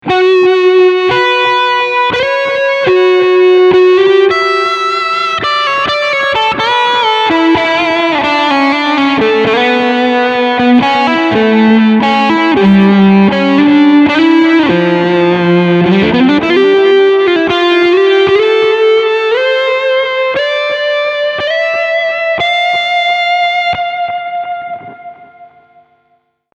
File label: Medium Delay Mod OFF Overdr